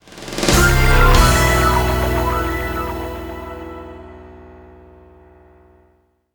jingles

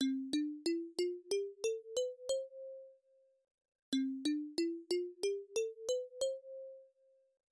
Stereo Check; Dragon Saber After Story of Dragon Spirit; Namco System 2 arcade

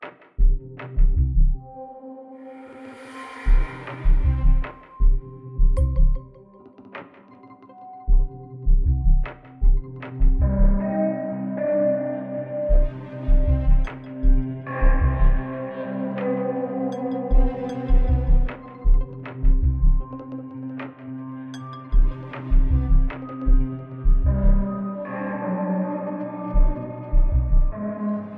Suspicion Loop; We Happy Few

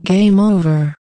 Gameover

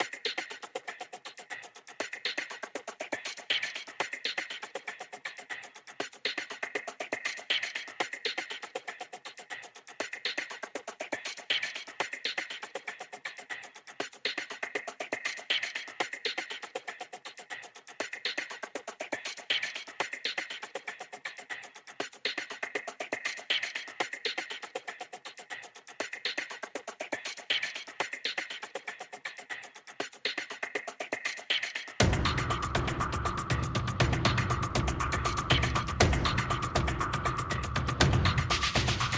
Synth perc Phrase; Worms Rumble; PS4; Xbox Series X/S

